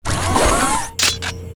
WAR IDLE; ARTHROP; Mob

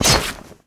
Sword cloth, Damage

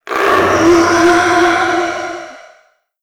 howl

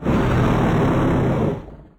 Rust War idle

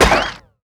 Gun Scale, Damage

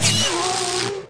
war damage; HOBOSWORD

